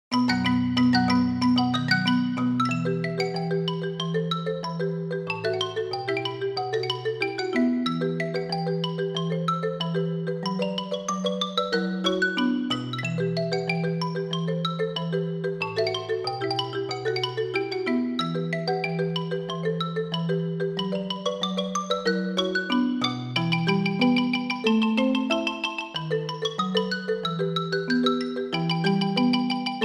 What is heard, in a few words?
Csikos Post Xy Fast